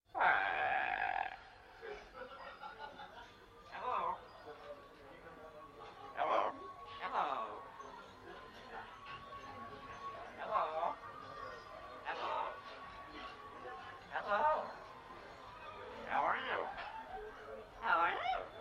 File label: birds